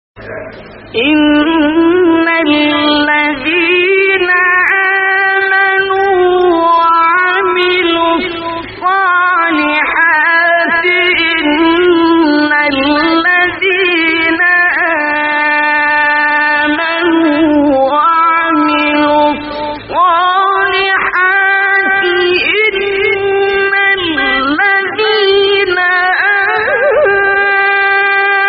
تلاوت آیه سوره; کهف; استاد عبدالباسط

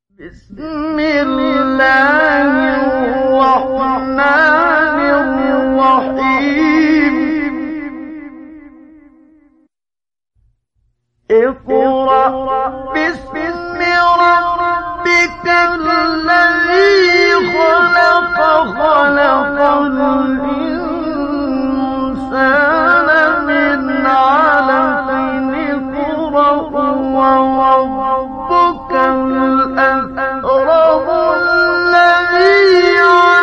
فراز زیبای تلاوت سوره علق; استاد منشاوی

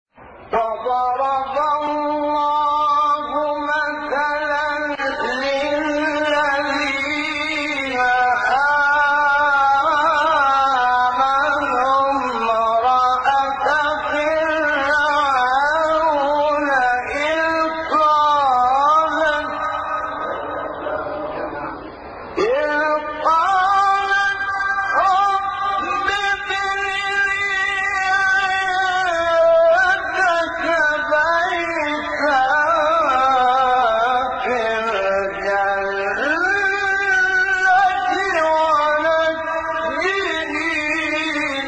فراز آیه سوره; تحریم; استاد محمد احمد شبیب

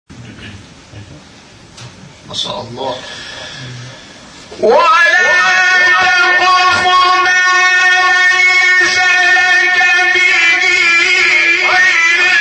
تلاوت آیه سوره اسرا

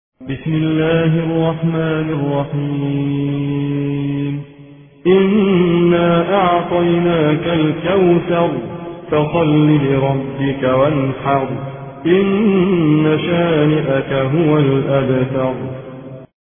ترتیل روایت حفص عن عاصم; سوره کوثر

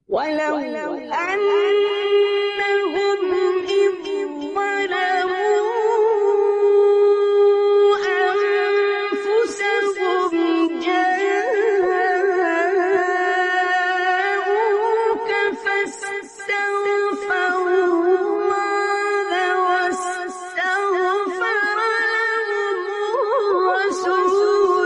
تلاوت آیه سوره نسا; 64; استاد عبدالباسط

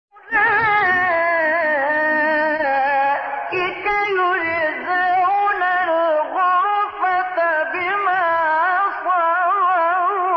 فراز زیبای سوره مبارکه; فرقان; استاد مصطفی اسماعیل

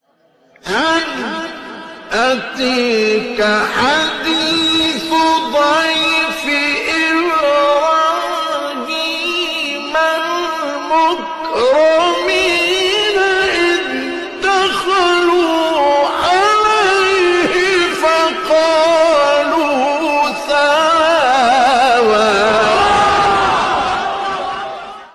تلاوت آیه سوره ذاریات; آیه 24 25; استاد مصطفی اسماعیل

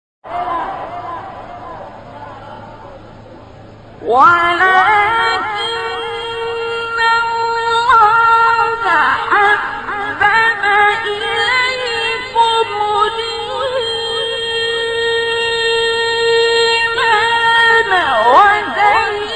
تلاوت زیبای آیه سوره حجرات; عبدالباسط

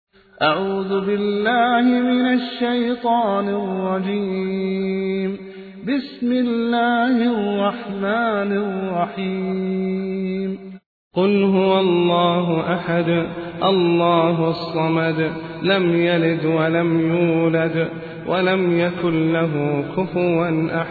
سوره اخلاص; العصیمی

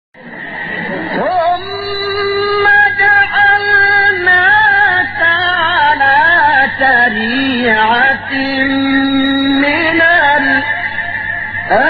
تلاوت آیه سوره جاثیة; آیه 18; استاد سعید مسلم

